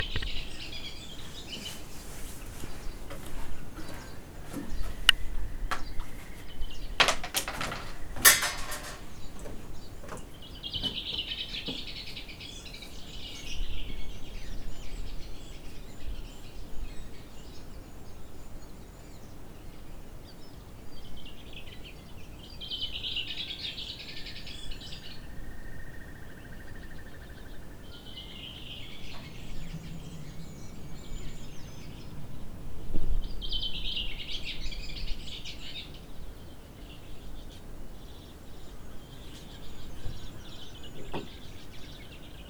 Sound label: Cabin Porch Birds; field-recordings; grenivik